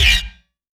Yamaha CS1x Drums

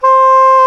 SAX SOP MP C, Soprano Sh

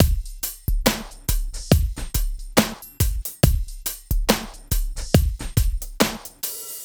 SloMo Beats, Loops 140 BPM

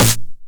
909 SD Tape Sat

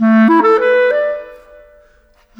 Clarinet; Sonic Foundry (Sony Creative Software); Orchestral Series VOL-4; Rock and Pop; Rock-Pop 05

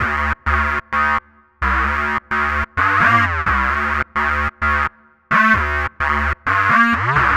Synths, Underground UK House & Electro

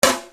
Snare Dr

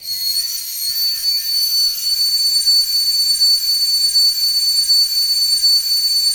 SQUEAL; ENVIRN-AMB

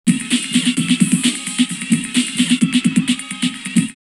drumloops Rave 130; X-Static Goldmine; 90 s Sample CDs

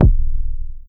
KICKS; Masterbits - Total Zone; DRUM ZONE

